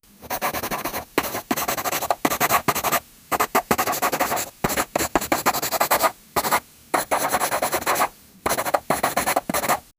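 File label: continuous pencil sketching, drawing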